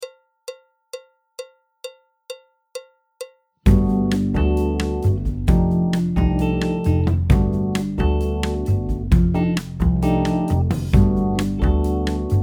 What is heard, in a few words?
piano; Jazz Syllabus Level 3